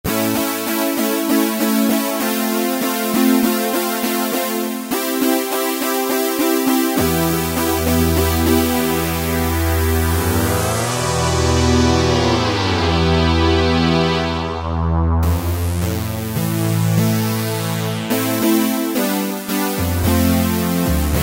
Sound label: Korg microKORG Bright Poly Synth; Factory Voices